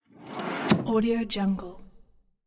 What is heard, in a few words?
household drawer roller runner small open; blazznet